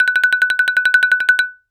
Xylophone hard rubber roll ff Gb6 stereo; MIS Pitches - 2014; Percussion

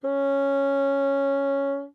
Bassoon ff Db4; Woodwinds

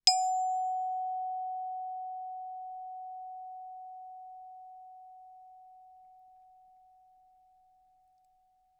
bells plastic ff Gb5 stereo; Percussion

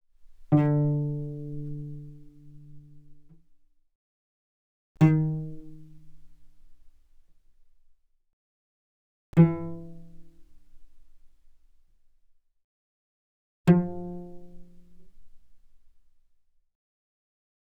Cello pizz ff sul D D3 Db4; Strings

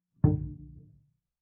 Bass pizz ff sul E Eb3, Double Bass; MIS Pitches - 2014; Strings

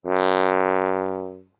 Tuba ff Gb2; Brass

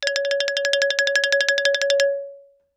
Xylophone rosewood roll ff Db5 stereo; MIS Pitches - 2014; Percussion

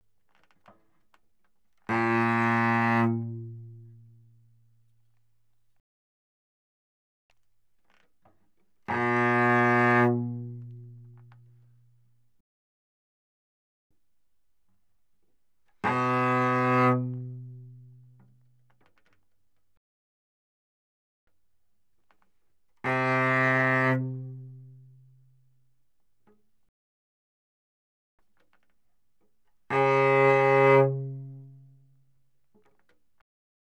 Cello arco ff sul C Bb2F3; Strings

